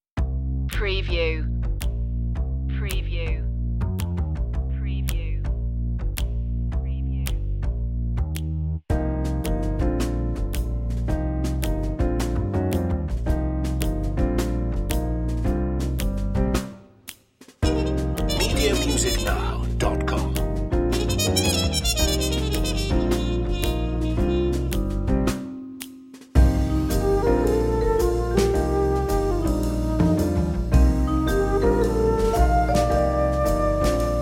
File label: lady swing